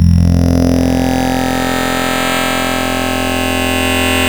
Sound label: Retro Sweep Random; Ableton Live 12 Lite; Vector; Sprites